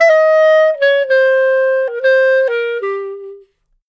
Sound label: Clarinet